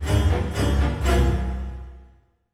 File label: STRINGACCENT, Orchestral Loops; Best Service ProSamples vol.33; AKAI